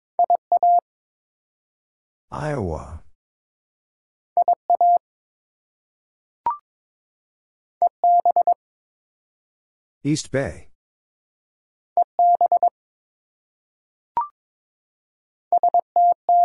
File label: morse code 22wpm; ARRL Sections v2